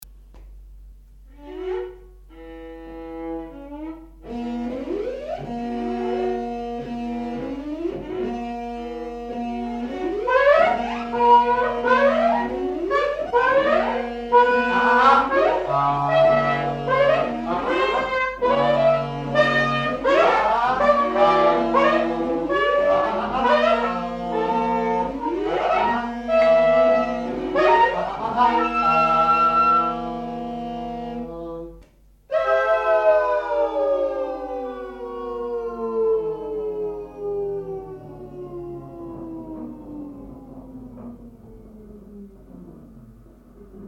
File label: aah a, tarzan